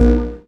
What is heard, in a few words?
Synth Sound; Vengeance Samples; VEE Synth Shots